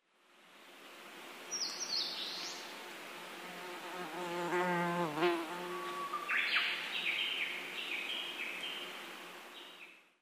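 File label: hae, fly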